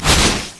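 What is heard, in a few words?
rolling thunder attack; LoL; volibear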